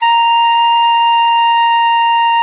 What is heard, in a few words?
DIZI A#4; E-MU Producer Series Vol. 7 – Old World Instruments